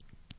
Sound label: Blink